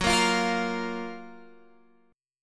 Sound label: F sharp Major 7th, Guitar, Open F; F#M7 Chord; strummed; x,13,10,12,13,12